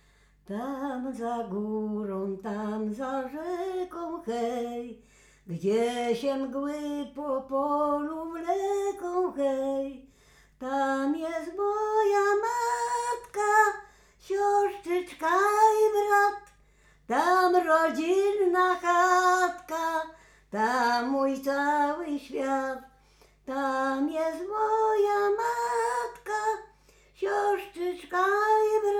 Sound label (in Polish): walczyk